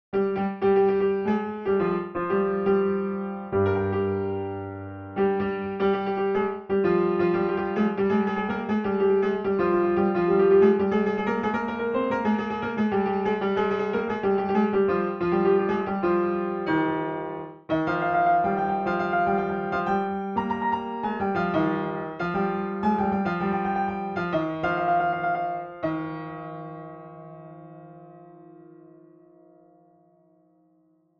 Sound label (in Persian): Basteh Negar, piano; Segah; Radif Mahjoubi